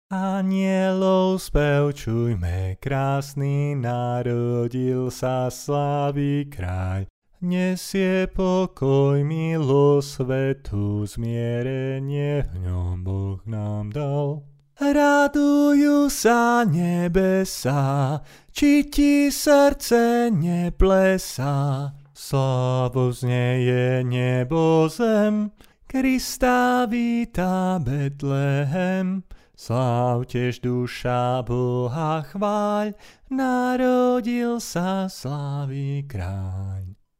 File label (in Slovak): bas; anjelov spev